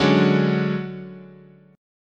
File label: D Major 7th Flat 5th, chord